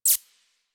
Mining Laser Gem Disappear; Weapons; Eidolon Plains; Warframe; Eidolon Mining Lasers

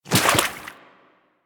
Infested Small Walk Water, Footsteps; Warframe

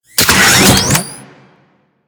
Collar Activate; Cinematic

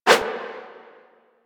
Sticky Bomb Idle Light Whoosh; Grineer; Veilbreaker; Vor

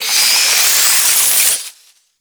Egg Mine Field Focus; Tau

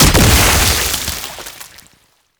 Infested Spore Pistol Explosion; Weapons

